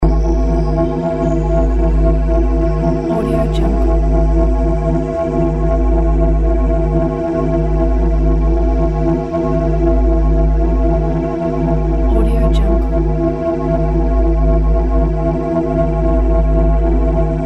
low pitched cinematic tension

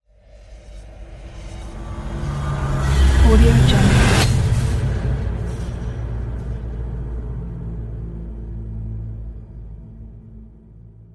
reverse rise tension

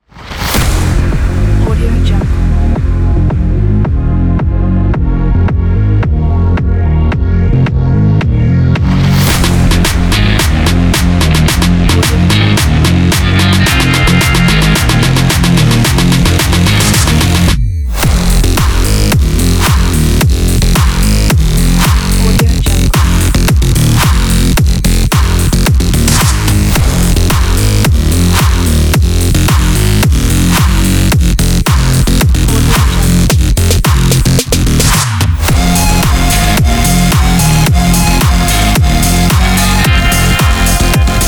power sport electro; cinematic-alex

